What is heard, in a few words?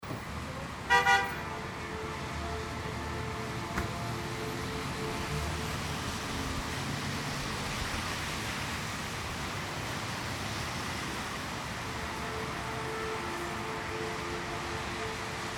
city lite traffic rain, City, Light Traffic, Rain; Light Rush Hour Traffic In Big City On A Rainy Day . Siren In Distance .; Cities